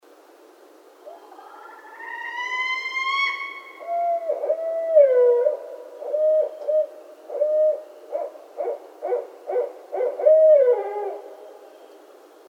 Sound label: Barred Owl